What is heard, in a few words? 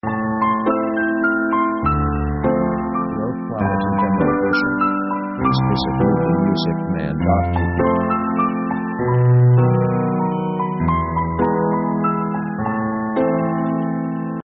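orchestral french walz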